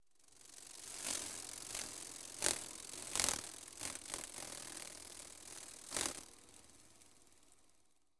Hummingbird Wing Flutters; Hummingbird Fast Wing Flutter. - Fast Wing Flaps; wings